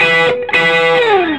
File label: SOLO E, Vintage Blues Guitar; AKAI; USB Soundscan vol.22